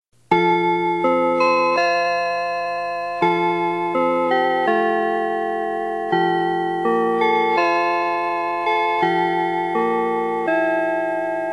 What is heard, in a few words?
melody, system clock; densetsu